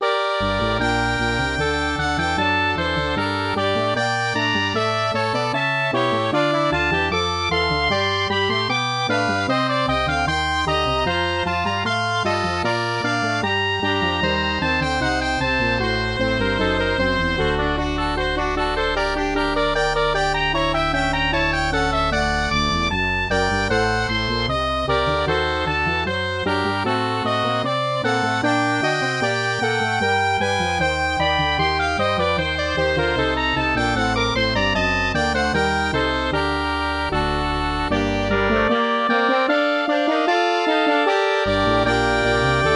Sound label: MIDI; BWV10